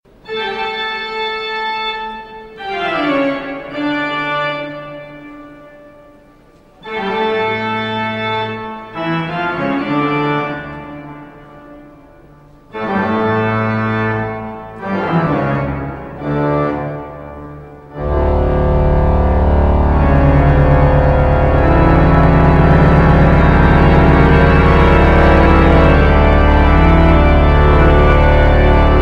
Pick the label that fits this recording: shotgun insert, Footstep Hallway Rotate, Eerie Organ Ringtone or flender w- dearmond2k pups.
Eerie Organ Ringtone